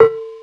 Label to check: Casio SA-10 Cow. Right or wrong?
Right